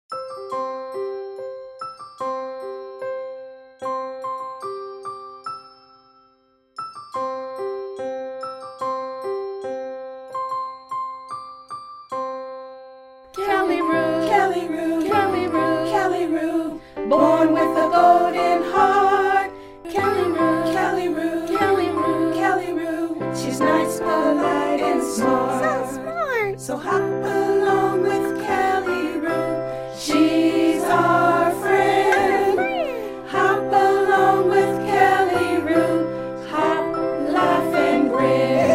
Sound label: song